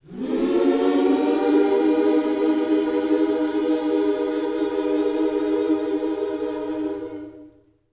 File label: MINOR, MIN.SCOOPS, Vocal Planet, Gospel